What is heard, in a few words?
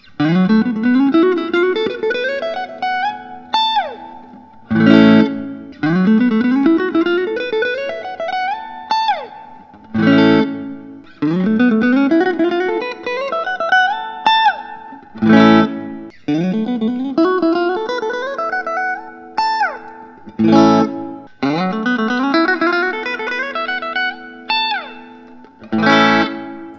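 fender usa american vintage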